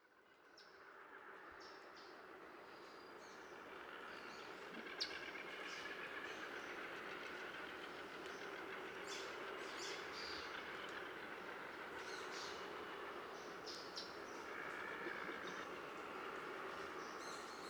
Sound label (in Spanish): paisaje sonoro diurno chatham, isla del coco; historia natural